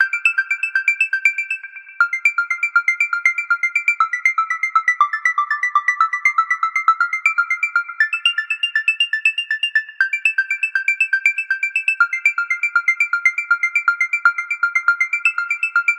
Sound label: quad Track High Bells Fall And Rise